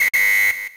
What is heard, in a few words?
whistle; Perfect Kanji Keisan Master DS